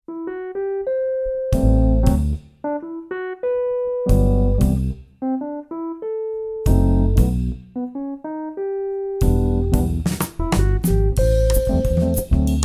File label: jazz